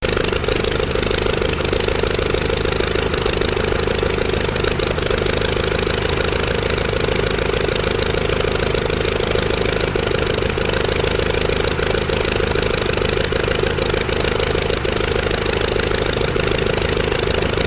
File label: Propeller; Scratch; Игра - полет